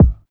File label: Kick; Ableton Live 12 Lite; Core Library; Drums